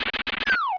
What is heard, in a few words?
Soldier Falls; Enemy; Open Zelda; Quest Designer 2.0.4b